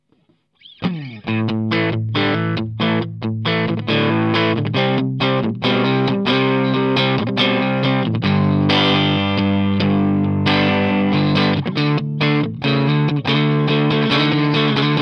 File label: 20w crunch